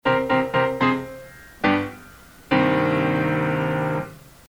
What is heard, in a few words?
Closed Cadence